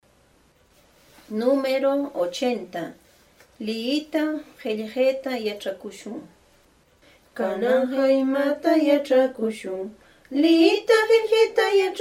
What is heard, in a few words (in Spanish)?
cancion